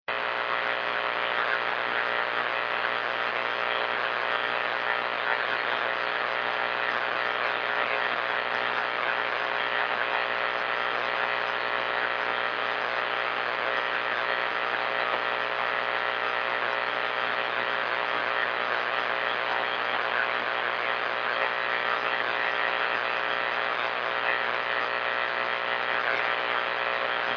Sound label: powerline